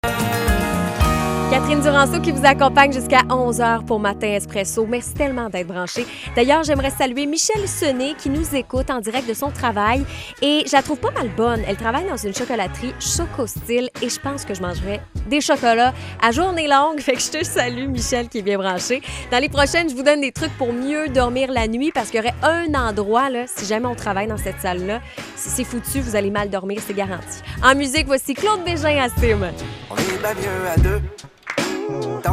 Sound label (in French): intro de chanson